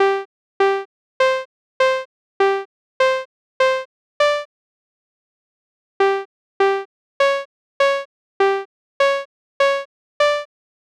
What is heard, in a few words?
lydian tracer